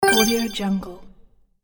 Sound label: dazzle hit; sonic-boom